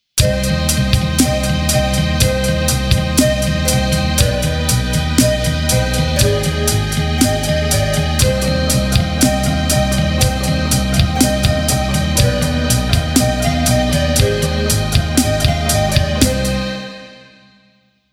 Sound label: Casio SA-46